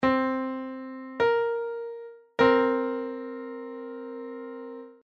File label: minor 7th, musical intervals